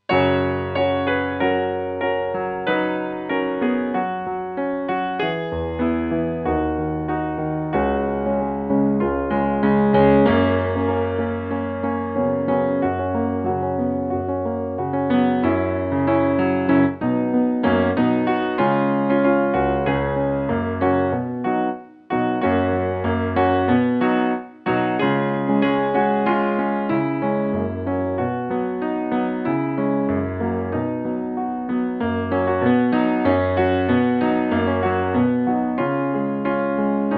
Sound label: Piano; Advent No.7 Signs